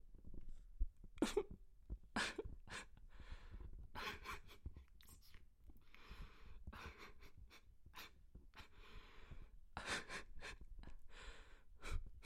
Male Crying Quietly

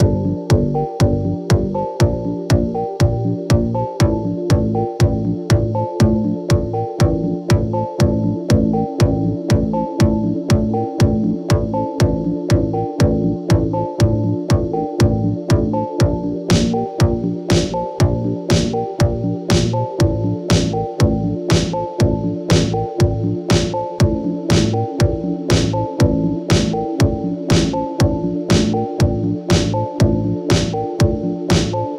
Electro Pop Loop

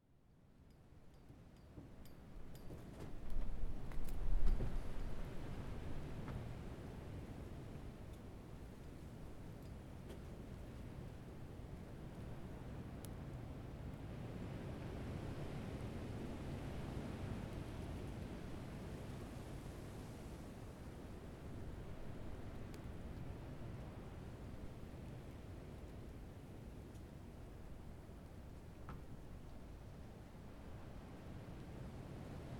Ambient Wind Real Wind

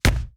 Hit

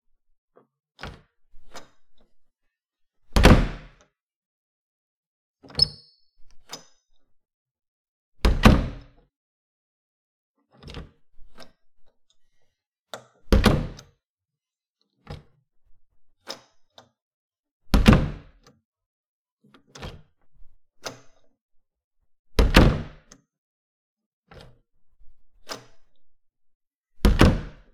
Door Slamming

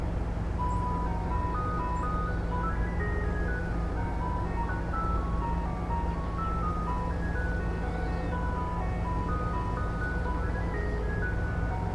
Ice Cream Truck

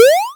2d character Jump

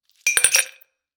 Ice Cube In The Glass

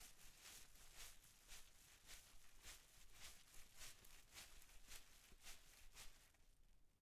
Fast Wings

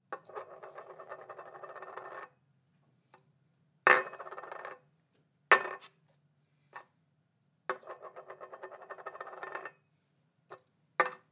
Empty Soda Can Put Down On Desk

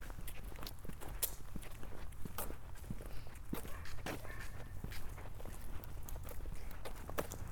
Walking the trail

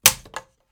Wood Snap

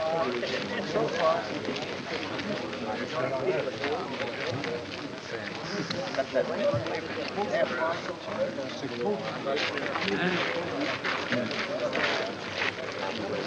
Outdoor Male Crowd Walking On Dirt And Talking

